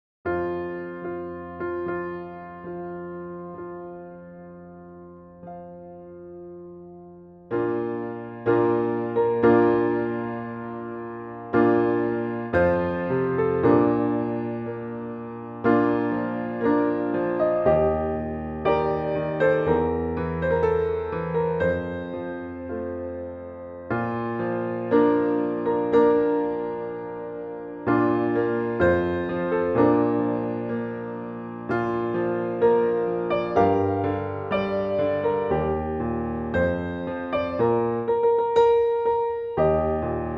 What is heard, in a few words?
Bridal Chorus piano; Wagner; Classical Sampler